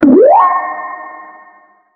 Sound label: anime beam